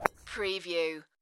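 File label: Golf Drive Wood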